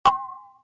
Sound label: bottle; TamTamMicro.activity